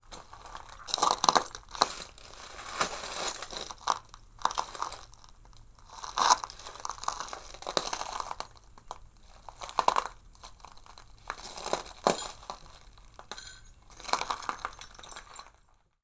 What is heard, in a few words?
foley footsteps broken glass debris; martinimeniscus